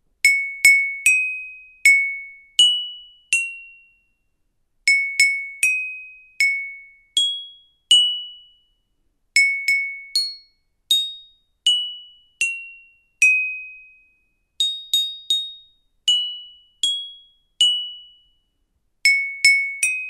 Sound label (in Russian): s dnem rojdenia ksilofon